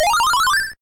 Big Heart

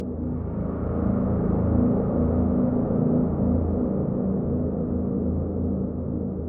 Logo Loop; Starblood Arena

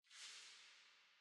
Creatures, Open Sing Start

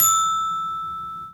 Note Grab Success

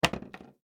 Picture Piece Floor; Granny Chapter Two

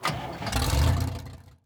vehicle musclecar ignition; teardown; ps5 windows xbox series xs

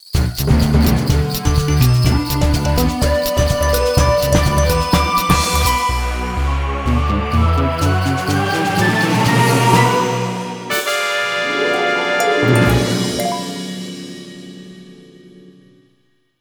Snow Minigame Outro Lose; Mirrors

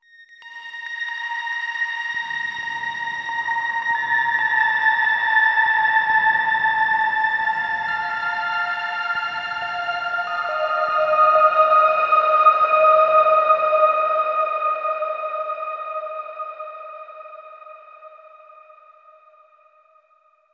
Stinger Exploration Tones

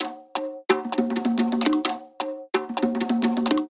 mariachi drums; WrestleQuest

